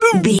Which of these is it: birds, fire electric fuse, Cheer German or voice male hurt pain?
voice male hurt pain